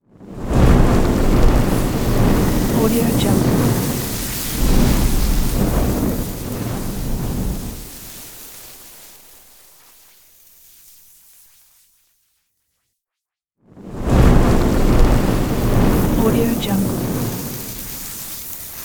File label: fire particles